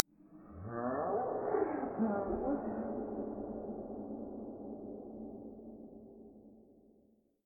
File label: Laugh